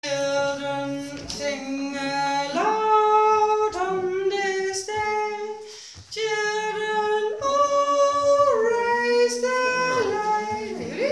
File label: Sing out loud